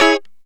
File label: Lounge Guitar Chick 9ths F#3; Lounge Guitar Chicks